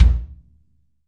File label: yam kick, Real Kit; REAL LIVE KITS; Multisampled Real Kit BJ-HD9